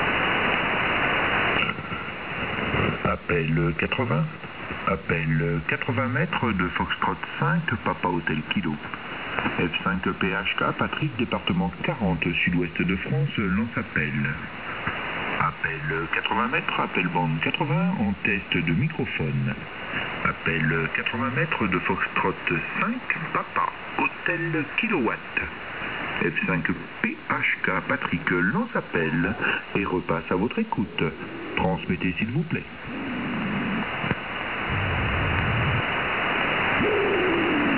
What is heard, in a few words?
websdr recording 3677.5khz